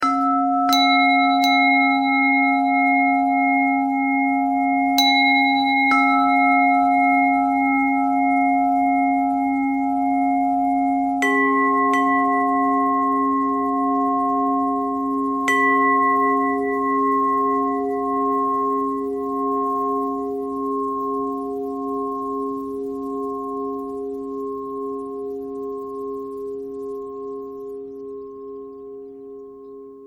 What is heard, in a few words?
bell thread pentatonic